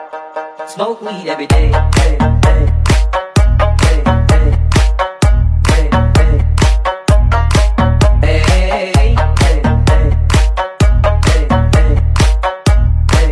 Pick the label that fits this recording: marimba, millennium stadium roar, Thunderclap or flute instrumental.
marimba